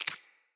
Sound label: Root Spider Step Dirt, Foley; Animals